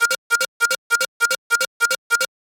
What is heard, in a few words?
gizmo alarm loop; UI; 3maze; UI FX